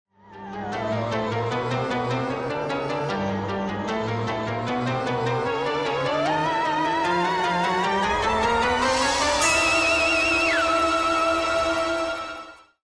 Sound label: start action; music kits custom